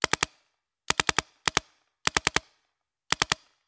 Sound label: Paintball Gun; Sound Ideas; Guns